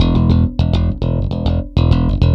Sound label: Electric Bass; Basses